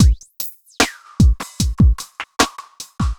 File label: evil beats 75